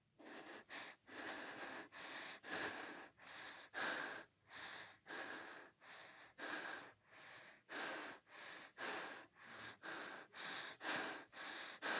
Young Boy Frightened Breathing

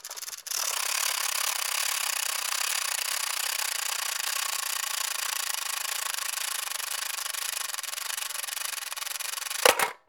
Rattling Toy Timer

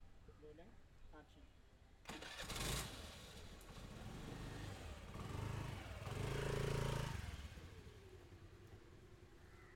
Scooter Start And Go Slowly

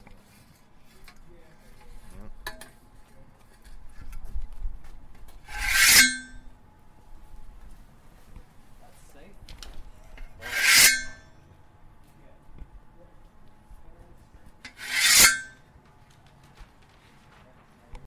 Industrial Steelpipe Scraping

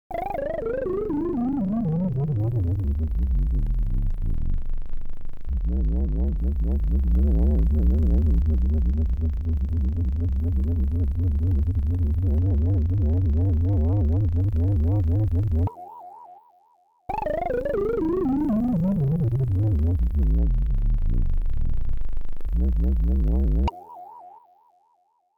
Space Sound Zen

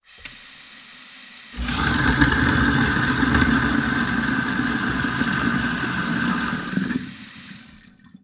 Garbage Disposal

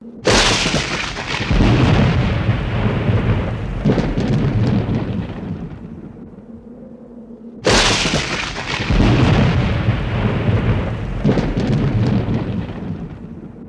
Thunder Thunder Cracks Like Wind

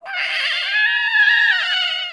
Dinosaur

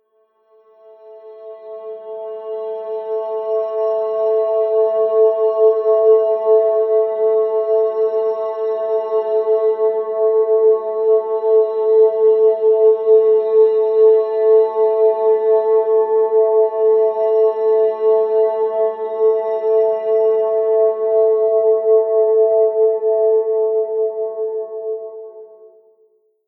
Pad Space Violin A4

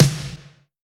Snare Transient

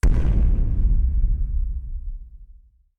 Booom

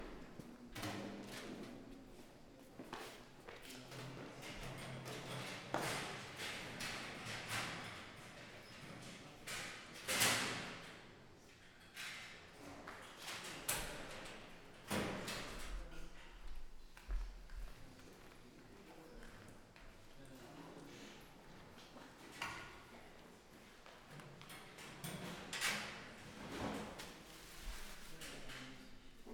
Boys Locker Room Walla

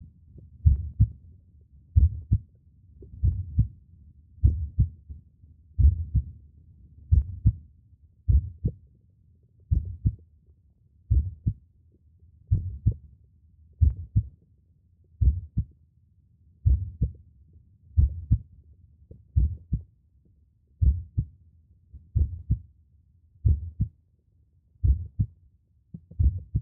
Heartbeat

